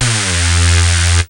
big, synths dance rap

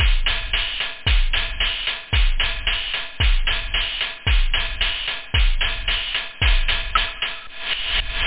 techno; OctaMED SoundStudio V1

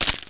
rattle

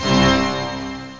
full pipe organ; OctaMED SoundStudio V1; mirage

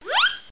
Sound flee zoop flee zoop

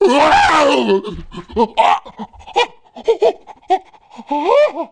ON FIRE; Messiah

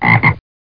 ribbit

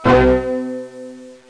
burst; Treasure Hunt

